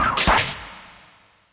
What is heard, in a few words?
Cut; Pokemon Solar Light & Lunar Dark 1.0.1